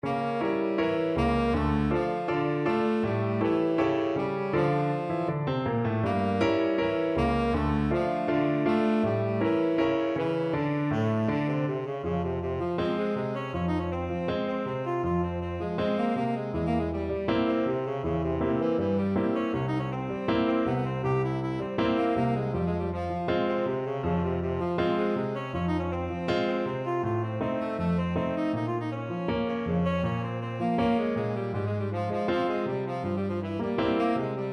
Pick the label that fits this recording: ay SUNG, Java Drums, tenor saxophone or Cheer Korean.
tenor saxophone